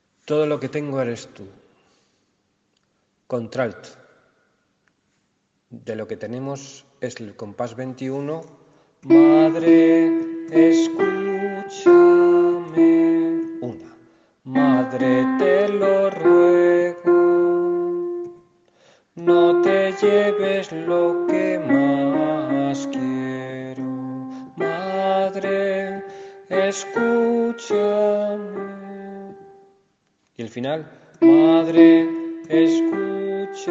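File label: alto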